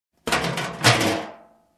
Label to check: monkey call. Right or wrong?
Wrong